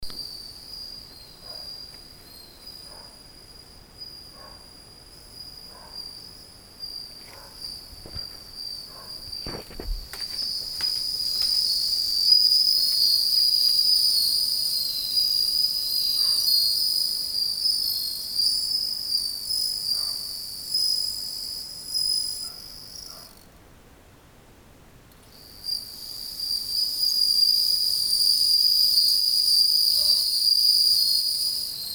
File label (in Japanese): suzumusi